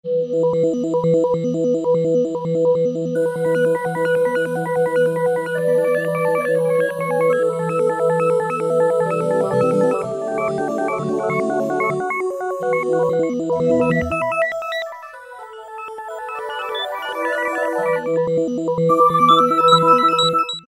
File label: Twit Pink, E-mu Morpheus; Factory Presets